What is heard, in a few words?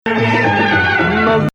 Nawa Athar Gameel Rast